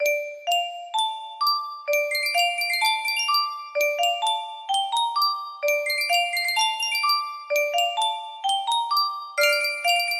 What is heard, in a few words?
Yunsheng music box